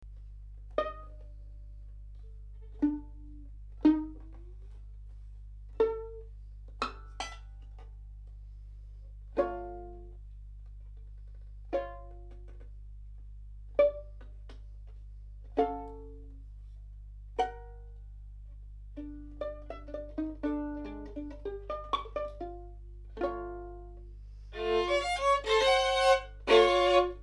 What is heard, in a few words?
violin